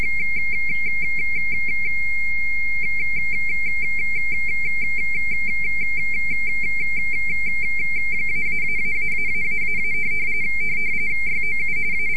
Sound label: rtty; rfi